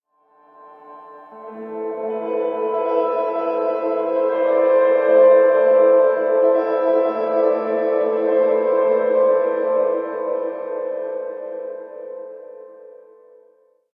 Map Piano; No Man's Sky

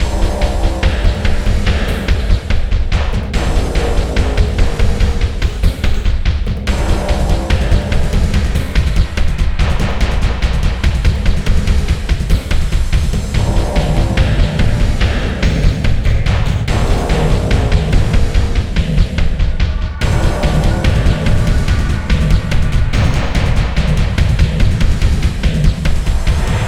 Boss Theme; Insanely Twisted Shadow Planet

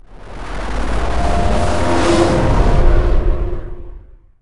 Sting Mission Fail; Assassin's Creed II Discovery; nds

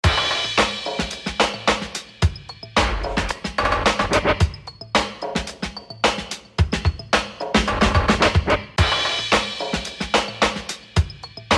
Beats; Luigi's Mansion 3

